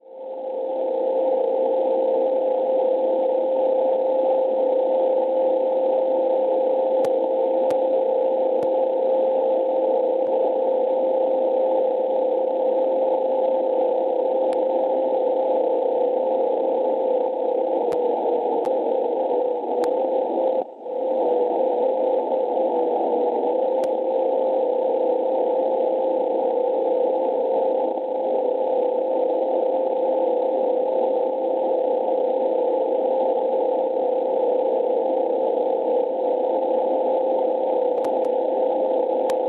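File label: non-directional beacon 315 kHz; HOL; Villacoublay; Francia; Schwarzsee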